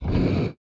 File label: flamethrower inventory move; factorio 2.0.55